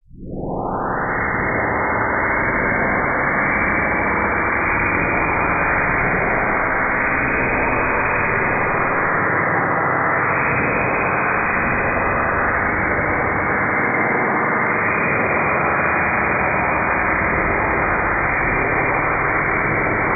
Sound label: acid swimmingpool, ambient